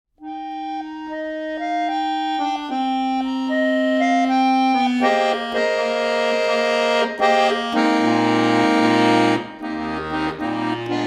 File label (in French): instrumentale